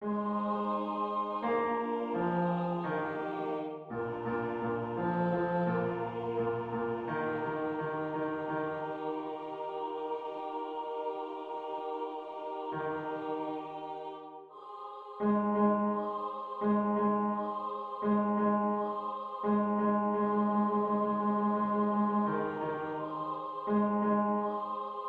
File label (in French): basse tutti